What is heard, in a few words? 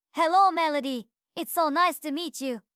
melody; teto